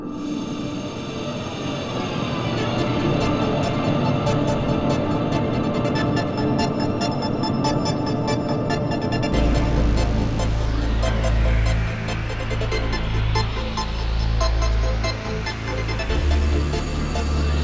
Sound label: Dystopic Technology, sci fi